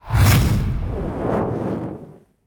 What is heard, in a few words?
random Wilds Wind; conan